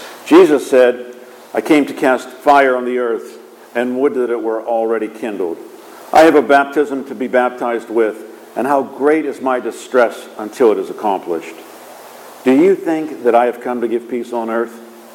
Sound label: Gospel